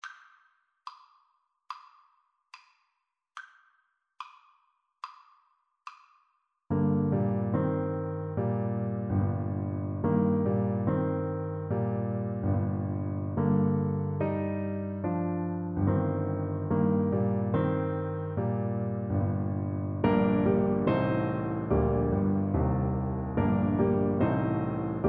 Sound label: horn, French Horn